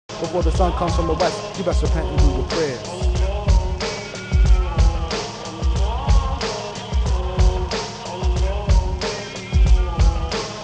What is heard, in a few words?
rap